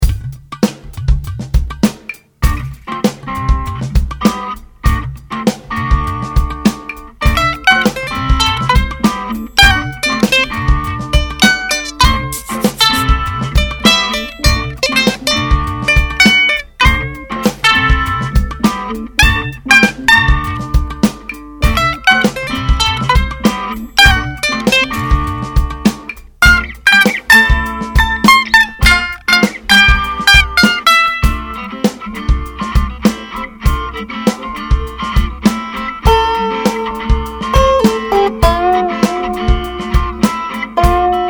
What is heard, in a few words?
reggae